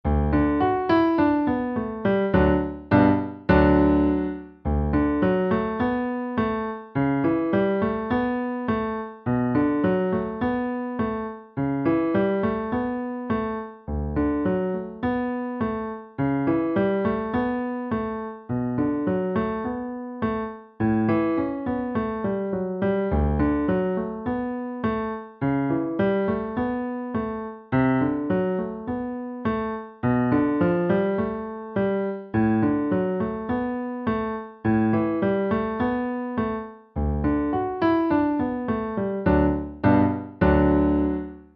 violins hard

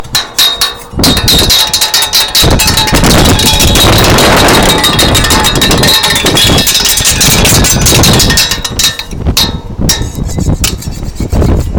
scraping paint; at the pan

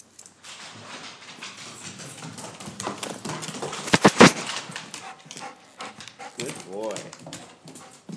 dog running down hall

